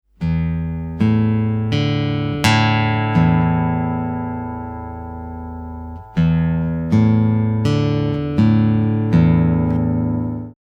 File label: E A and D strings, guitar